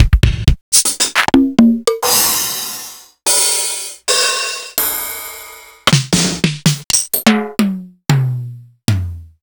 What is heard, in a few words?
Korg DRM-1